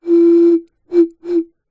foley blowing air over top of plastic bottle short; zapsplat